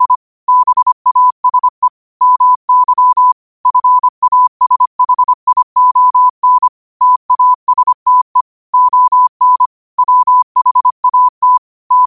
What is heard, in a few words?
5 wpm, morse